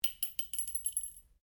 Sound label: Cartridge 22 Caliber Long Impact Ceramic Tiles Stereo; shell casings of different calibers impacting on different surfaces